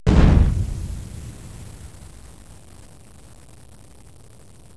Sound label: EXPL; explosion sounds